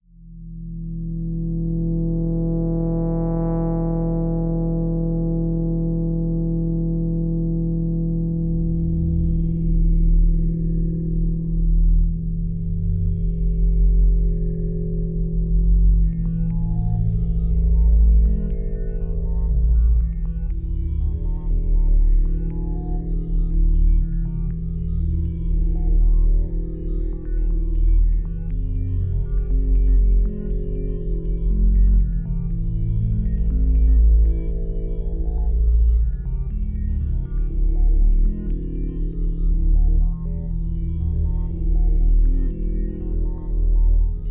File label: Ambiant